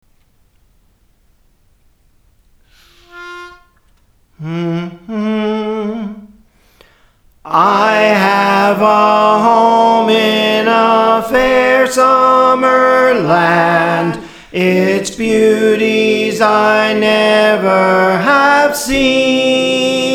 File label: Soprano, Praise For The Lord Training